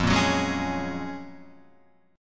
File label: F sharp Major 9th